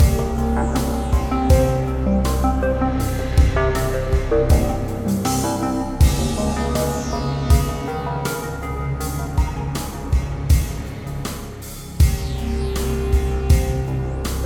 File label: Robo Zombies, sci-fi